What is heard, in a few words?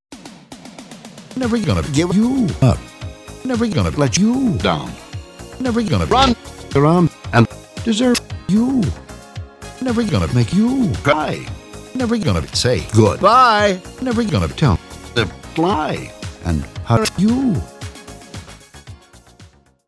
Whit Roll